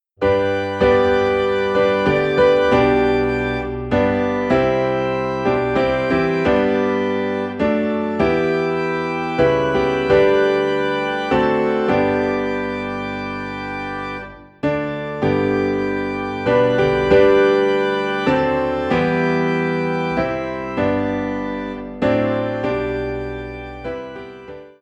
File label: Piano and Organ in G; Amazing Grace